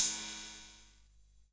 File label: CYM, KR-55